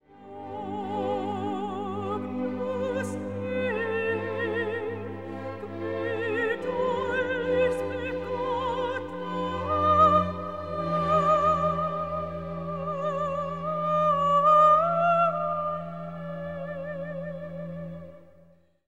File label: Soprano